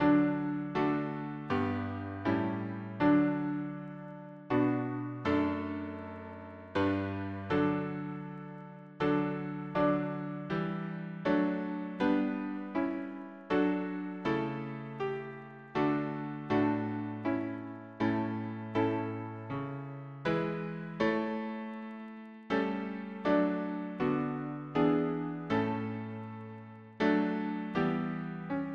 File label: harmony piano